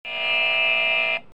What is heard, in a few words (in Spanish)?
incorrecto